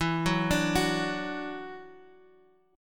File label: E 7th Suspended 2nd, down, Guitar, Half Step Down